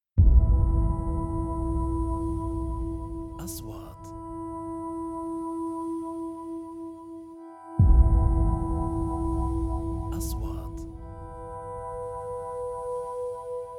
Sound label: Trailer inro; مقدمات تشويقية